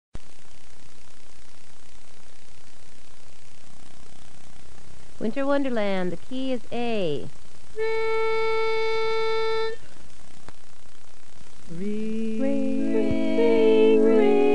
Tenor Dominant; holiday music

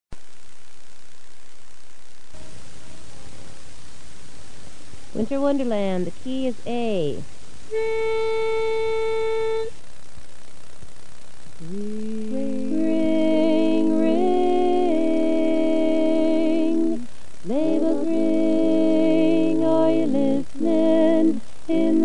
Lead Dominant; holiday music

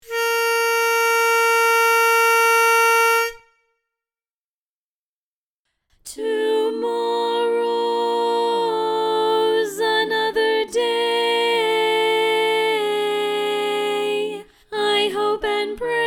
loud tenor

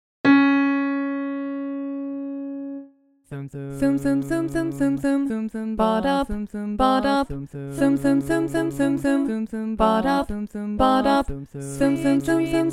bari dominant

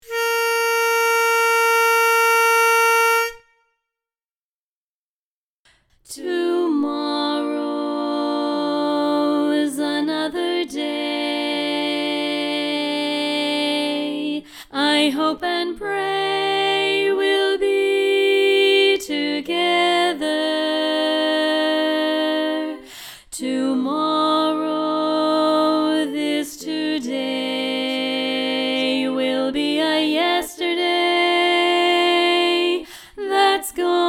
loud lead

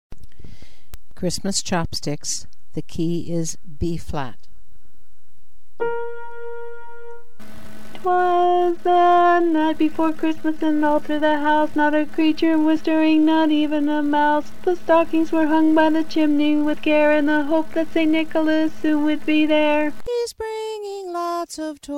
Xmas Chopsticks Bari Dominant; holiday music

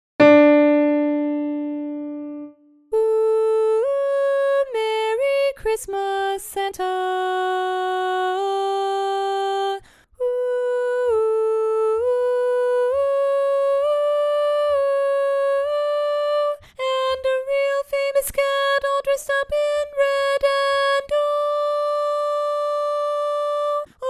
Tenor Alone; holiday music

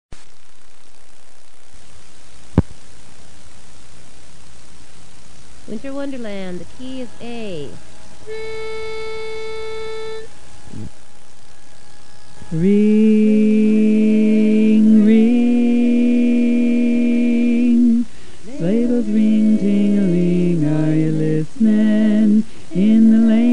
Bass Dominant; holiday music

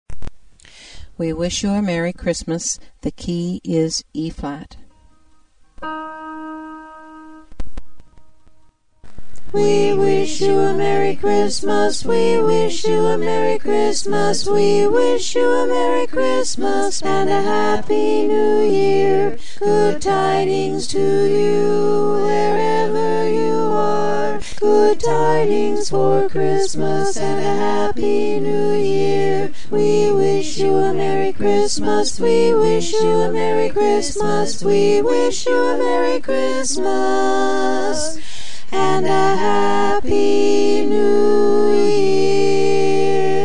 TENOR; WE WISH YOU A MERRY CHRISTMAS; holiday music

